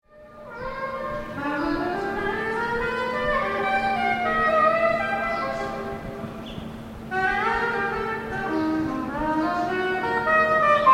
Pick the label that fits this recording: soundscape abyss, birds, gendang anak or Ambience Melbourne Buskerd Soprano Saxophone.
Ambience Melbourne Buskerd Soprano Saxophone